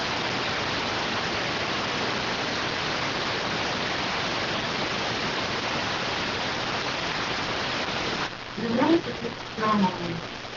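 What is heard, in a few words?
radioteletype receive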